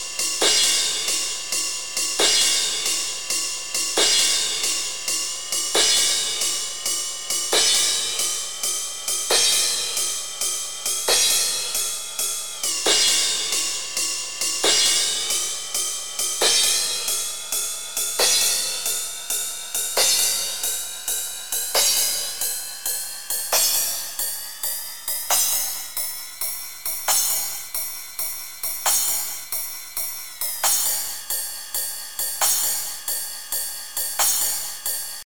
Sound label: Roland TR-09 Cymbals